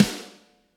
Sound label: Shotgun Snare